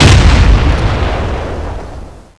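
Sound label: drone missile explosion; goliath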